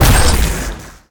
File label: Rush Attack Hit; MUDevs S20.1-3